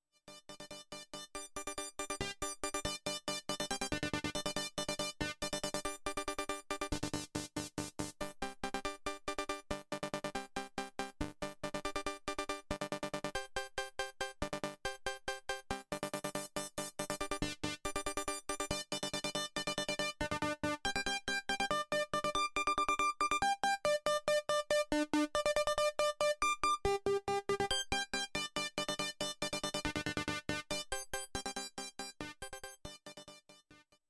doepfer a 121 a 138j

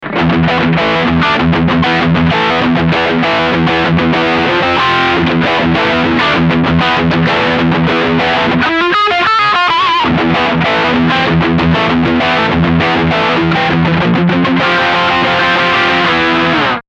Riff TSS Midrange Boost

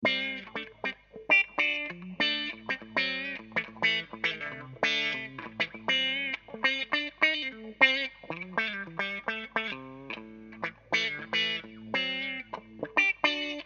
sens 12h range switch

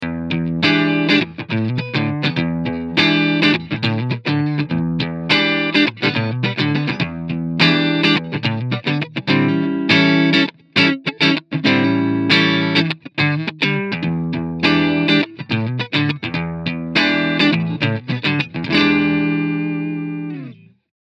Clean; GarageBand

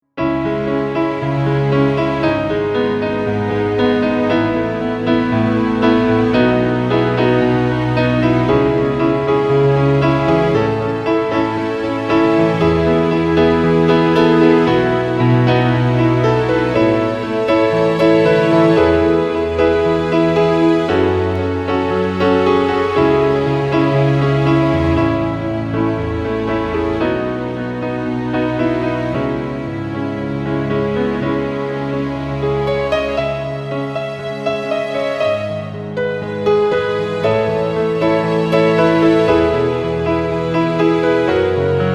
Nord Grand Grand Strings Layer

